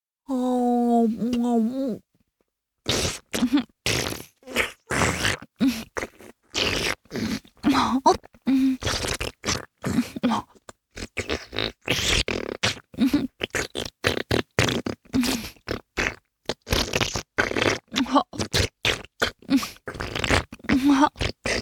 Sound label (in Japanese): フェラチオ(強):頭を前後に振って陰茎をしゃぶる, チュパ音, ASMR; チュパ音抜粋集